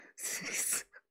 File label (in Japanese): 苦笑